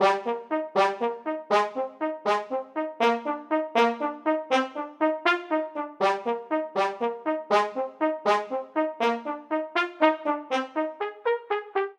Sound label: Ostinato Sprightly Arp I-IV-V-vi Eb Major 80 bpm, Brass Quartet; MIDI Clips; Ableton; Spitfire Audio